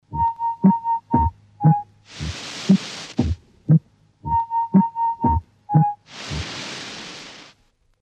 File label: rhythmic variation, Electronic Sound Patterns; His Master's Voice